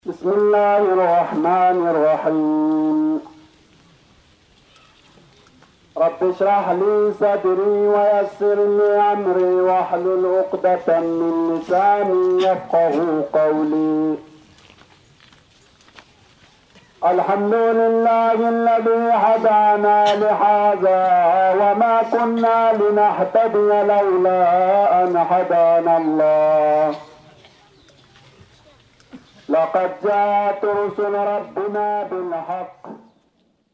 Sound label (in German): Ganesh Andacht, Pakistan